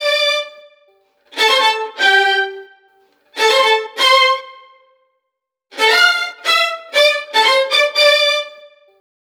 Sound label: STRING LICKS, Disco